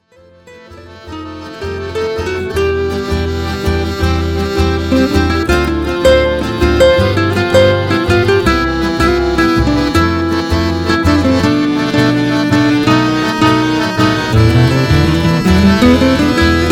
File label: TANGO